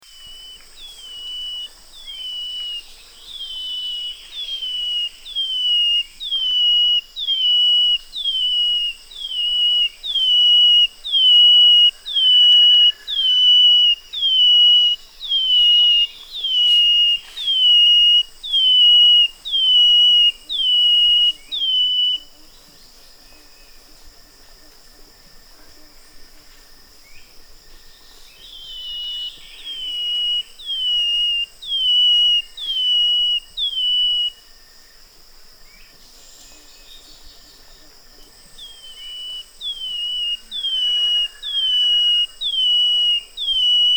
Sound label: Brown headed greenlet; صدای پرنده سبزک سر قهوه ای